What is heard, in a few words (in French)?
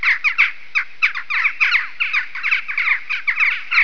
choucas